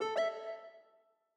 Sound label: Hardware Insert, sound scheme; Longhorn 7ista Sound Scheme by David Mounder